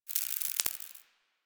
Exterior Forest Critter; Ambience; Duviri; Warframe

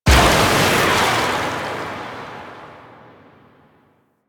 Pirate Cannon Blast; Warframe; Warframes

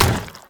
Zombie Run Metal, Footsteps; Warframe; Infested

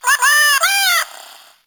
Cat Loot Crate Meow; CatLootCrateCommon

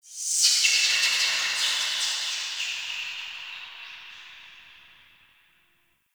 Root Cave Distant Chatter One; Ambience; One Offs; Neathuns Root Cave

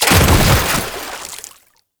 Body Fall Water, Foley; Enemies; Warframe; Tau Dax

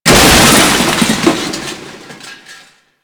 Shipyards Crusher Arm Debris; Ambience; Props; Warframe; Grineer Shipyards

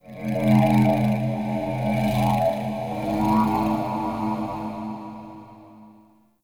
Root Cave Didge Texture; Ambience; One Offs; Neathuns Root Cave

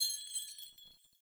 Foley Arm Bells; Jotar; Friendly

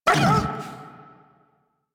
Feral Vocal Pain; Warframe; Kubrow

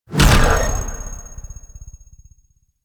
Zap Throw; Warframe; Trapper

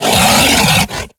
Kubrow Feral Vocal Melee Attack; Warframe

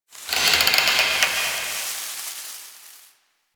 exterior tree wood creaks angry; Ambience; Props; Warframe; Duviri

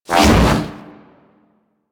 Hoverboard Jump Spin Fast; Warframe; Vehicles; Venus; anim sounds

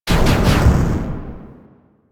Elevator Door Explosion; Warframe; Caliber Chicks minigame; environment

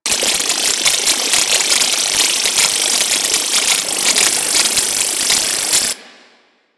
Beam Loop; Super Moa Beam

